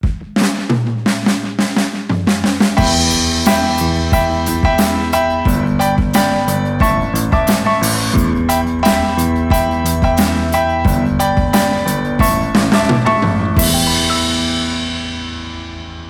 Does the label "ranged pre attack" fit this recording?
No